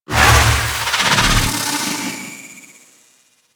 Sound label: idle agile power; Warframe; Warframes; Ninja